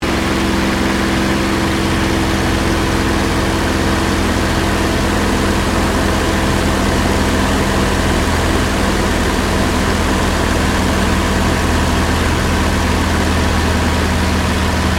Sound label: AIRCRAFT SUPERMARINE SPITFIRE MK-XVI FLYING LONG INTERIOR